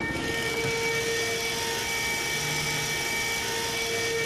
Elect Wheelchair, wheelchair

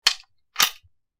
Standard Bullet Load

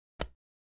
Darts Pulling Dart, darts

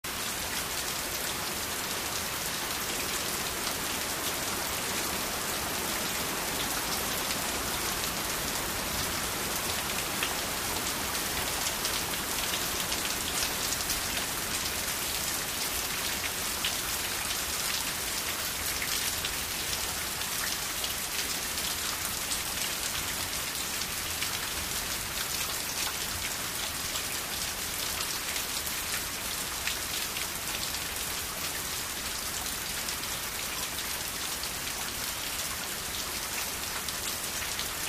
Rain; Heavy On Concrete With Good Splats, Cu Pov.

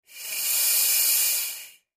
Processed Air Release

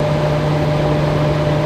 Dehumidifier Loop; fans big

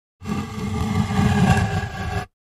2x4 Dragged On Cement

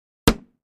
Gallon Jugs Strike To, plastic

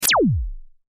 Laser Gun; lasers

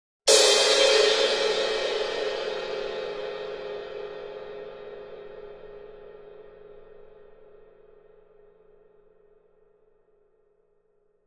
Cymbal Lrg Sngl Hit, drums cymbal

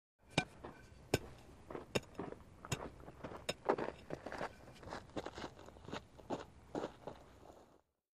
Baseball FS Bat Taps; baseball fx & games

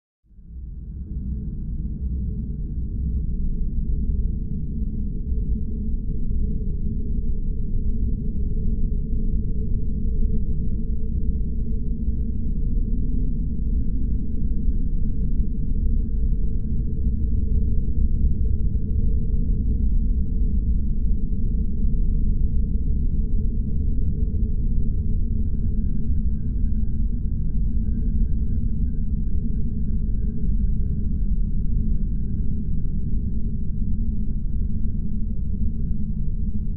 Bed Cold Dank Drone

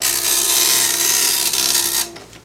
Dot Matrix Printer; printers

